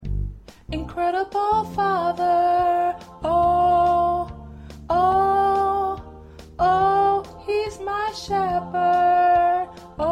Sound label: Ensemble Alto